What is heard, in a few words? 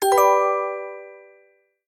button play